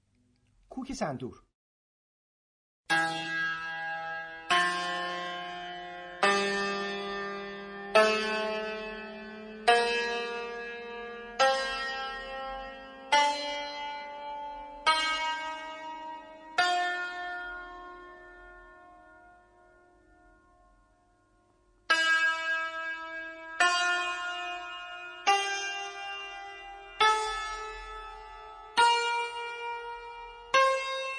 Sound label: Santoor